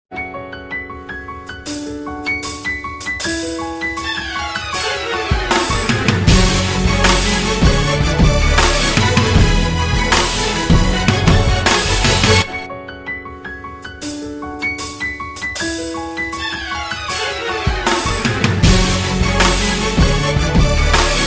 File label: Piano Mix Tape